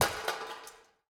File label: Fall Metal Thing Distant; Decaying Factory; Blond Panda